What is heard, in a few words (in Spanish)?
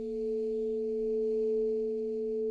Multiarmonicos